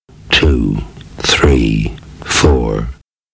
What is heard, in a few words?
Jingles